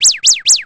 groggy on; npc

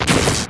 jupitel thunder storm; hyper novice

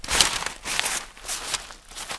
equip item

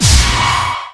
attack; GUIDE OF DEAD

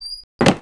door

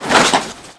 hornet stand

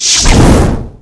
ABYSS FLAME

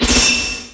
angel iceslug attack

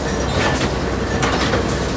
machine loop

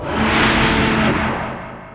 damage; tengu

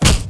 player wooden male

